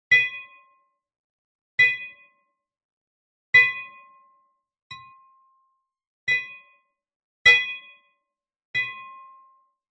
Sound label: impacts metal clang on rusty old bulldozer various contact mic